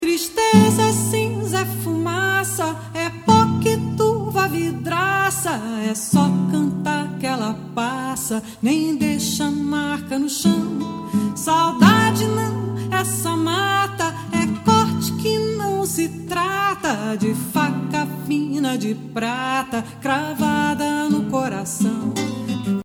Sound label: singing